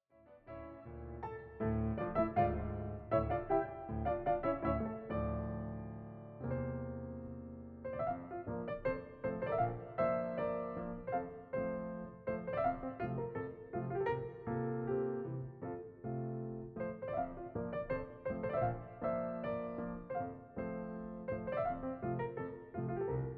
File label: Hungarian Dance in F Major- Allegretto; brahms; 21 hungarian dances; WoO 1